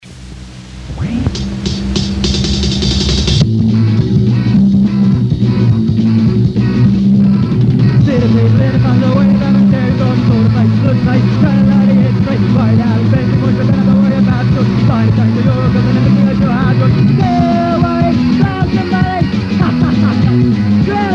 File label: Jazz Drums; Canada